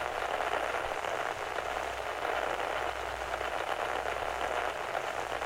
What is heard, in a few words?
static; AssaultCube